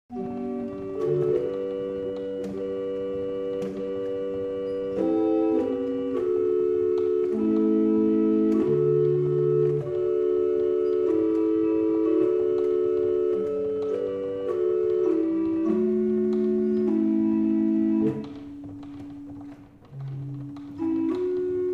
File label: Morning Hymn, barrel organ; Liddington